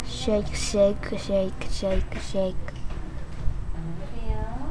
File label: shake